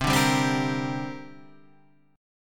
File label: C 7th Suspended 2nd C down Guitar Half Step Down; C7sus2